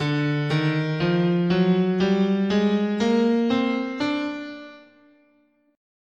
D Eight tone Spanish, scale

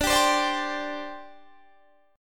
D 7th Suspended 4th, down

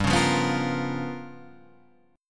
G 7th Sharp 9th Flat 5th G, Guitar Half Step Down; G7#9b5

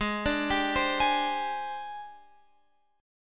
G sharp Major 7th Flat 5th, Guitar, Open F; G#M7b5 Chord

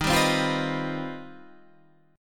C Minor Major 11th Eb; CmM11/Eb strummed; JGuitar

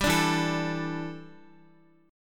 E Minor 11th guitar chord; Em11 strummed; JGuitar

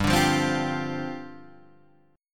C Suspended 2nd Suspended 4th, G

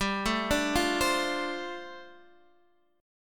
G 7th Suspended 2nd Suspended 4th, Guitar Open D

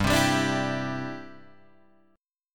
C-Major Add 9th G, Guitar Half Step Down

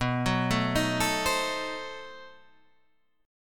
B 7th Flat 9th, down

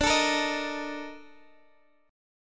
A sharp Major 11th D

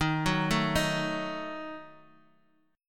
Eb Diminished, Guitar, Open D

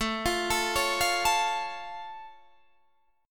A Augmented A, Guitar Standard; arpeggiated; JGuitar; 17,20,19,18,18,17

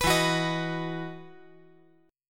E Major 7th Suspended 2nd E; chord shape

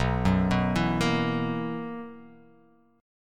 B Major 7th Suspended 4th B down

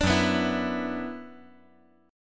F sharp 7th Suspended 4th Sharp 5th

